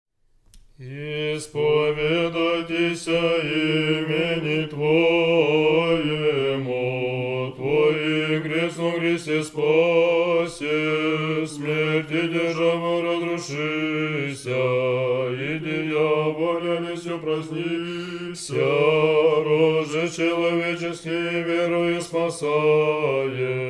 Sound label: tone 3; sunday